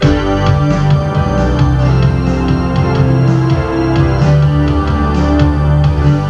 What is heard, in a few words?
Drums and Rest; MIDI Primer 1.0